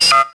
Security Denied; Max Payne

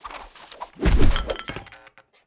hiphop drop in; Tony Hawk's Project 8; XBOX; bedroom

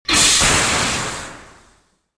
door

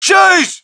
crowd, shout; Mike Tyson Heavyweight Boxing

